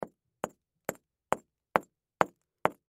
Tool Archeologist, Tool Hammer Pick Rock Hit Impact Multiple; Hand Tools; Foley; Blastwave